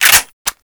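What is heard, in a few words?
weapon foley p90 reload chamber; Call of Duty Modern Warfare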